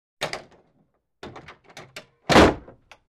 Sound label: Opened and slammed the door; Открыли и захлопнули дверь